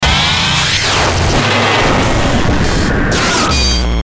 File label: Stinger Dirty Distortion; Production Element Imaging Element Accent Transition